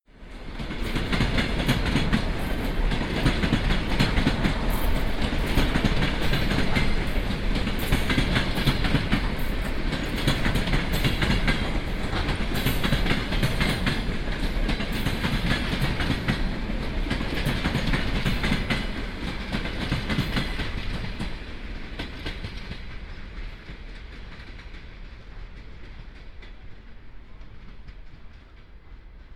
rhythm train

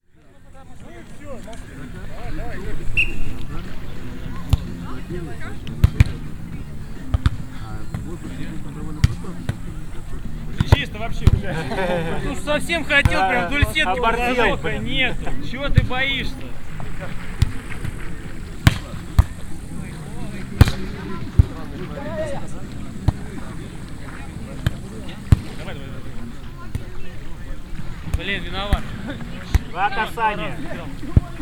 voleyball; ferma; sergiyev posad

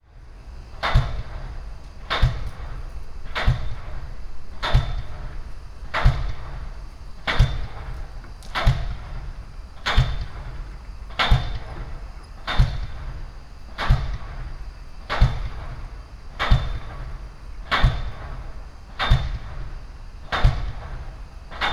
piles; sergiyevposad

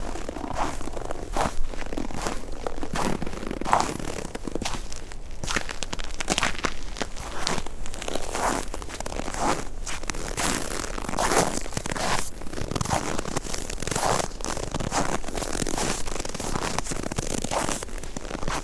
snow, sergiyev posad

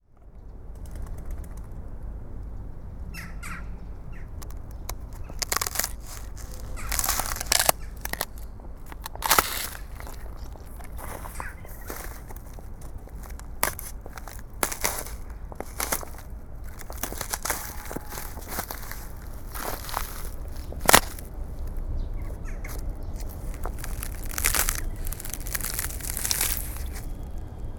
crackling icy patches; sergiyev posad

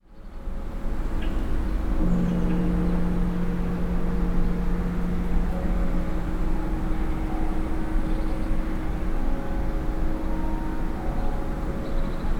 2 churches; sergiev posad

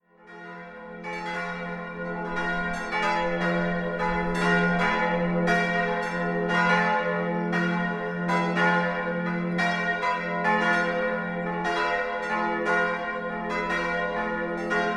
hvar bells

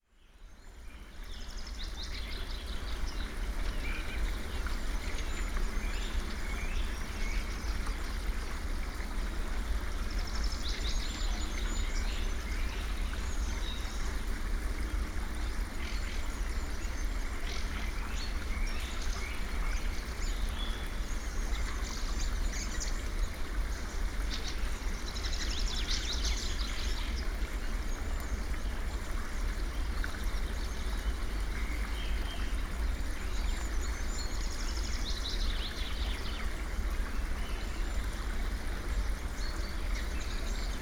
ferma stream, sergiyev posad

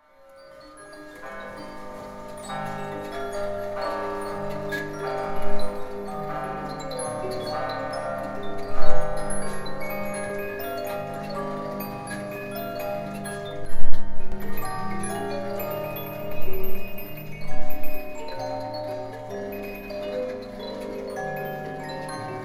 jukebox; musicntimemuseum; yaroslavl

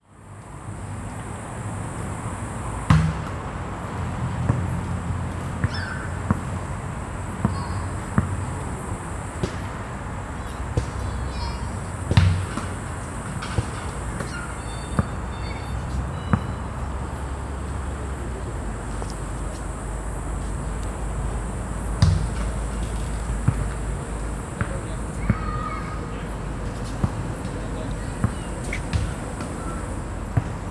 basketball player; skitskiye prudy

